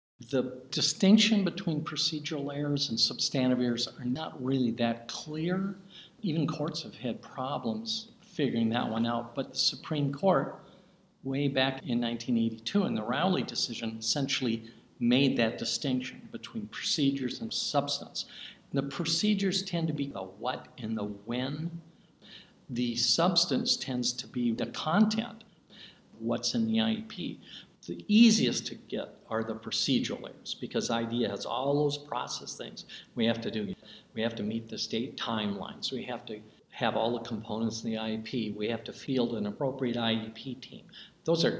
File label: yell